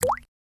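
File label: Drip Single Falls Liquid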